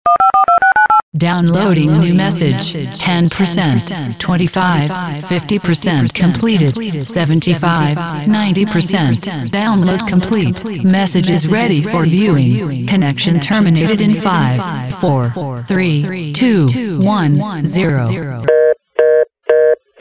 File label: Downloading New Message